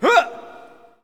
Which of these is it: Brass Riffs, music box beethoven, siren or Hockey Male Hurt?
Hockey Male Hurt